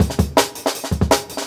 164, Jungle Breaks

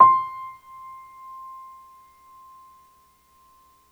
Piano Grand c5 soft; TrashLibrary

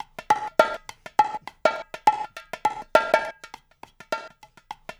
loop, bongos; InVision Interactive; Percussion Slam

